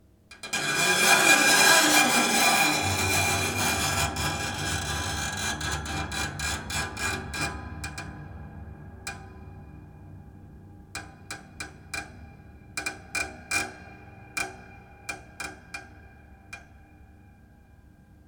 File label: Scrapes